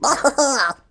Cat Fly; Rabbids Big Bang

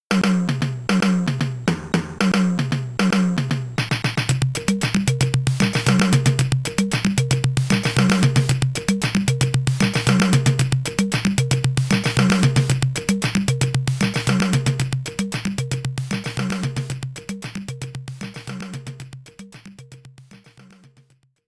Carnival Stage Shot

